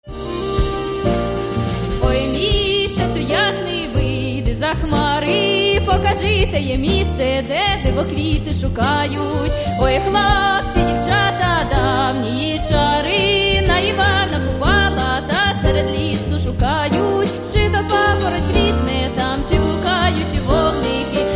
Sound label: domra